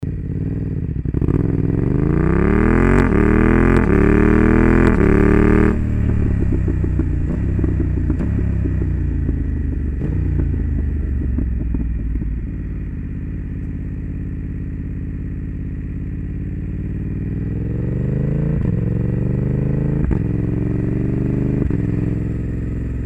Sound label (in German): BMW F900XR Slip On ohne DBK; REMUS